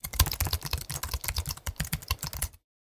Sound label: Computer Keyboard Typing